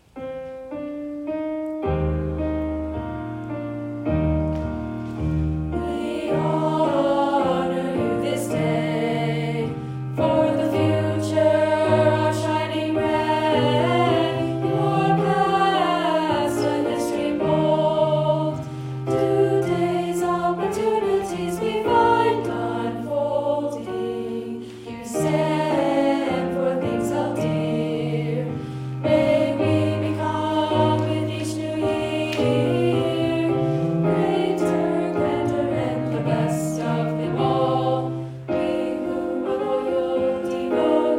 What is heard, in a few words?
Loyalty Song alto; TBS